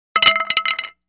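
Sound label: baseball bat falls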